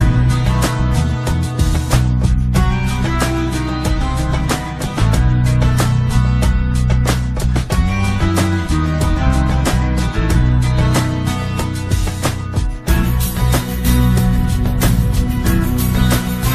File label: turkish sms sesi